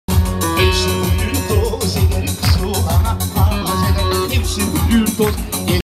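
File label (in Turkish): bico halayı; tokat dugunu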